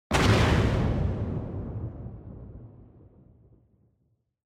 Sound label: Explosion Long